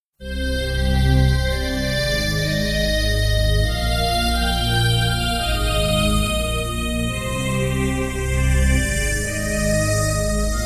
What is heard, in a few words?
Trance